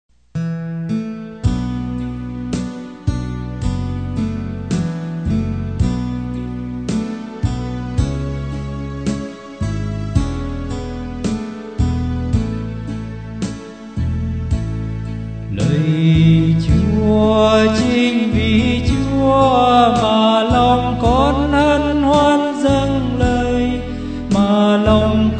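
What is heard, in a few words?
soprano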